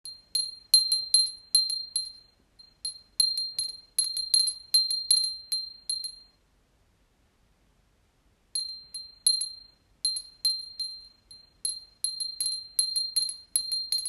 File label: windbell